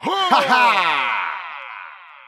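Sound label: "huh ha ha", vocal sample; ooh ahh; heh huh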